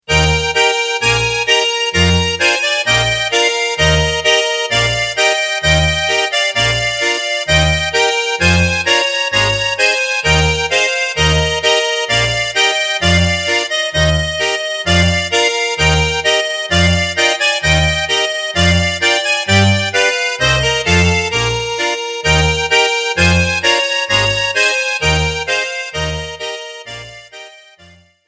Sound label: Eb